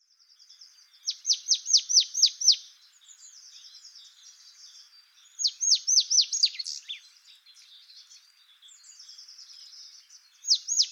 Poecile palustris; SETE

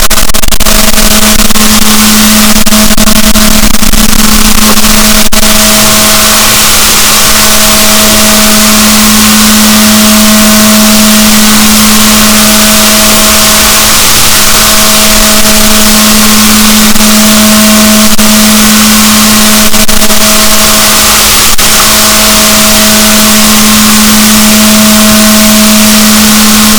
rorquals negatif, boat

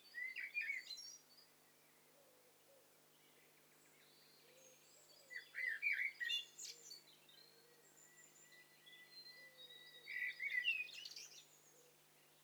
STEREO BIRD COCKTAIL PARTY

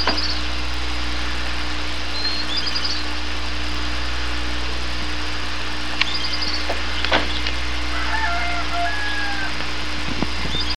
bird

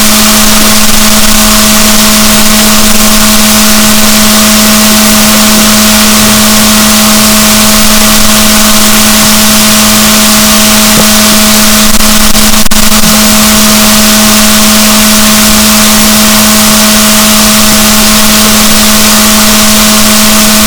rorquals negatif, boat

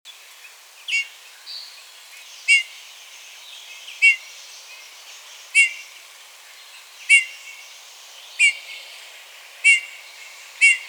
Pyrrhula pyrrhula; SETE

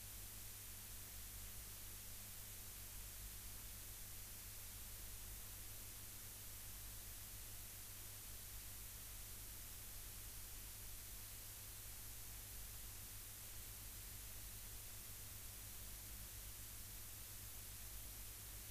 rayol farm; SEAMED

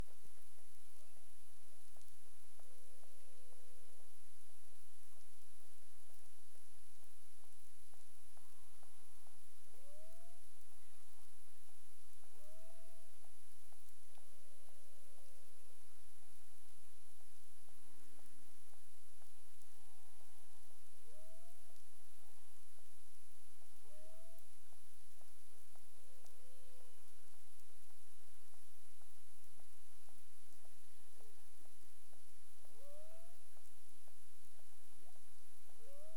clic; BERMUDE

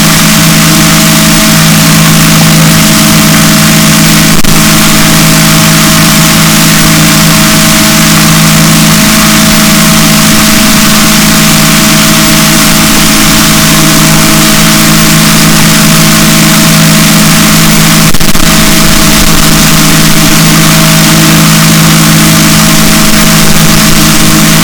rorquals negatif, boat

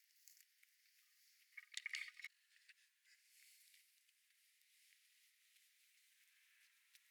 DRONES

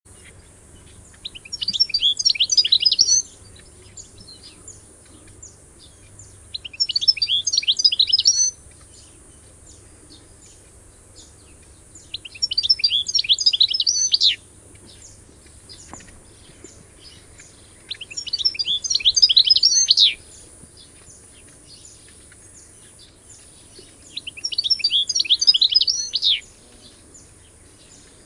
Carduelis carduelis; SETE

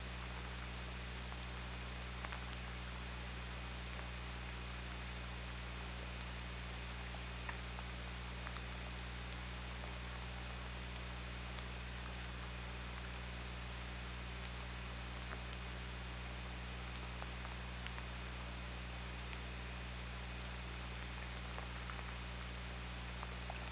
OrcaLab

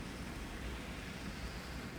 pulses, BALAENOPTERA; MONACO 2022-07

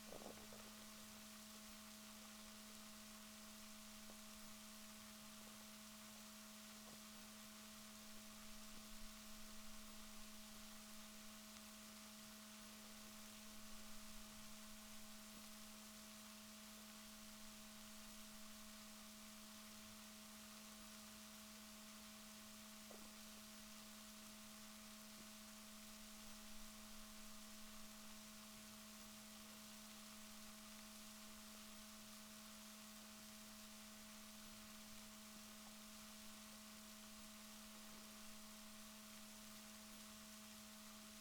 rorquals negatif, Balaenoptera Physalus Chile

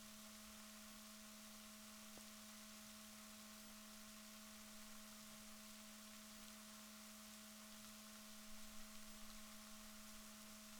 rorquals positif, Balaenoptera Physalus Chile

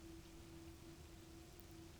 pulses, BALAENOPTERA; MONACO 2022-07

